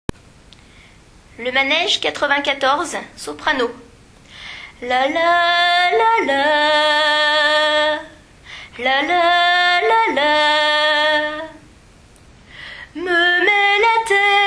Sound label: Soprano